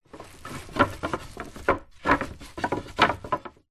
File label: foley wood slats in plastic bag movements